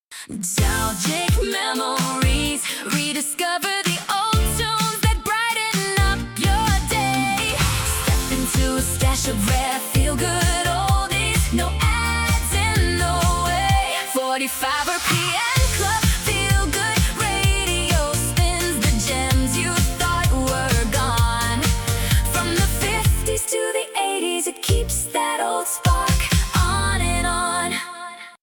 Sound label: Jingle, radio; rpmclubradio; Suno